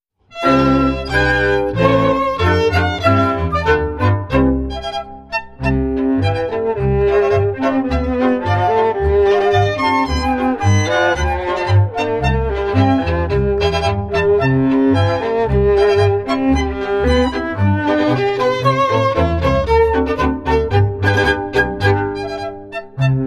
Marsch, volksmusik

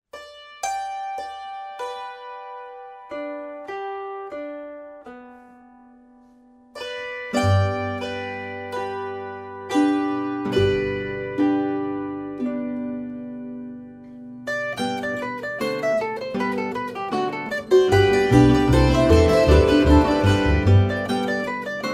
Spielmusik Sterzl-Dreher

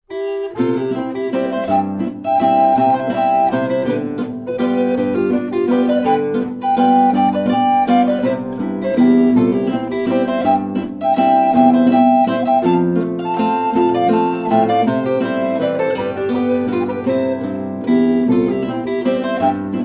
Walzer

